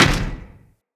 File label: Step Default, Footsteps; Moa